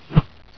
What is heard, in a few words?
woosh; the hat chooses the wizard